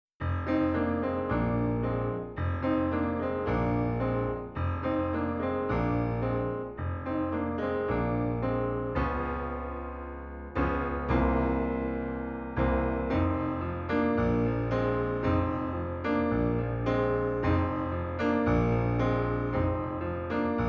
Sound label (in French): blues accompagnement